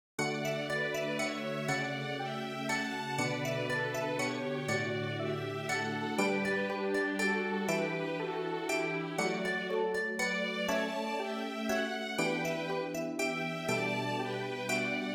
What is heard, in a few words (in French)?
orchestre